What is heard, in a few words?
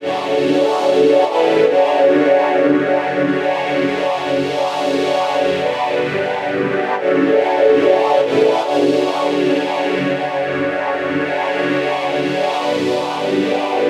Bpm138 Pad Loop Dm, Dubstep Chord Loops; Scope